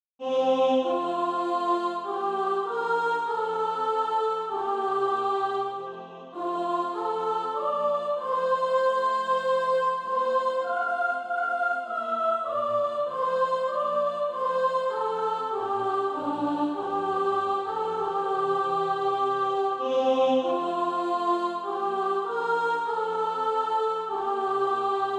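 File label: soprano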